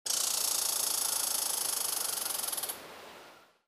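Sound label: chipmunk chattering sound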